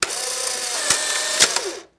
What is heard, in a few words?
Polaroid Camera Eje, shutters & movement